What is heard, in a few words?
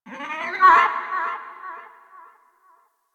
Forest Distant Creature Medium Cry; Warframe; Grineer Forest